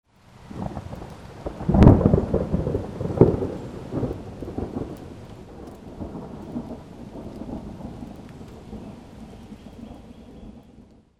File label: RAIN